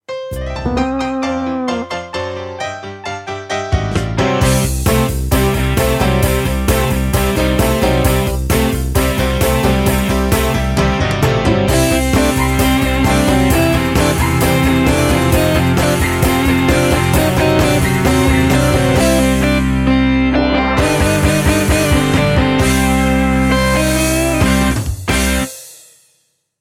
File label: rock